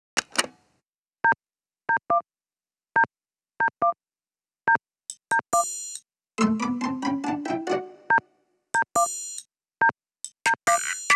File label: Telephone Record